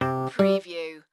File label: Casino Electronic Sound Two Tone